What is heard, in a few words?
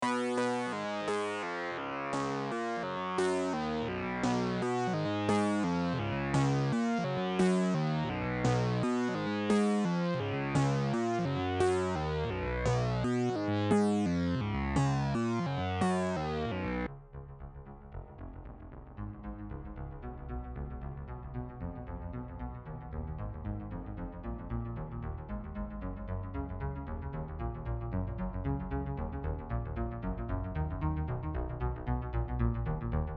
Prophet 6 114; Dave Smith; Full Pack